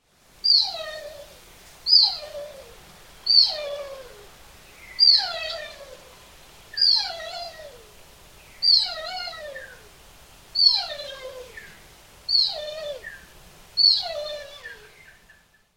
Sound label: ruffed grouse female squeals